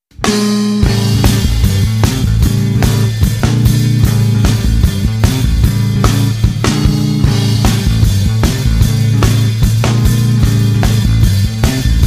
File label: best of disco